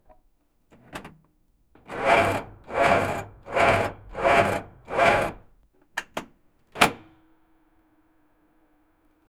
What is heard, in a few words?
bench press, gym